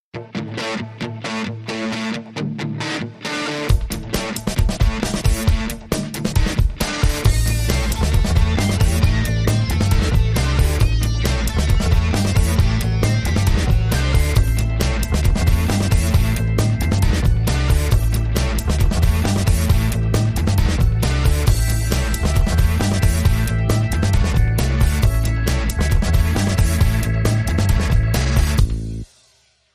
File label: Rock My Pop